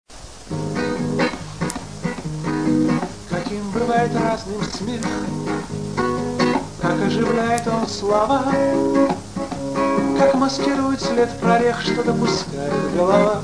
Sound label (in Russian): Смех